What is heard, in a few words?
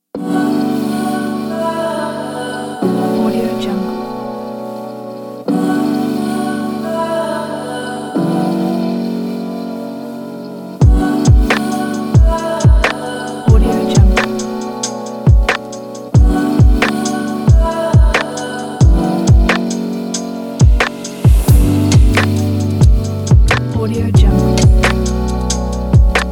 lofi